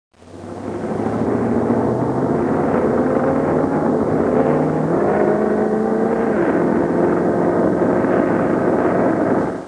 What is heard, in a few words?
wild running rev